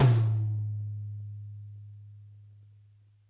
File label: tom, Interactive Drum Kits; Sonic Reality